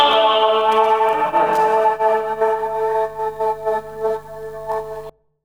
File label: Atmos, Strings & Pads; Zero-G Sound Sense Grime Scene; 90 s Sample CDs